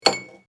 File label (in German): Kueche Glas